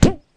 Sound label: jump